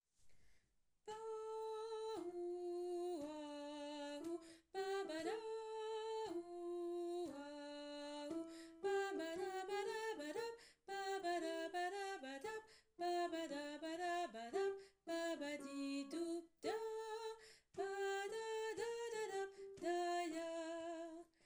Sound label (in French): canon scats